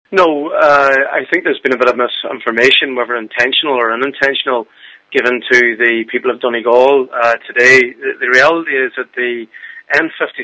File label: pad